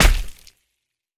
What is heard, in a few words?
Flesh Impact; zps